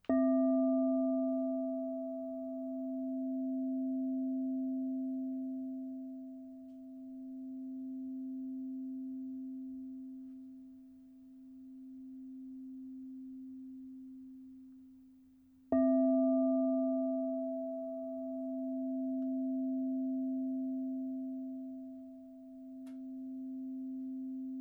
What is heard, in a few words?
frosted 10inch C +-0, crystal bowl